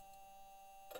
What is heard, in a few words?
mic, mars2020 edlcam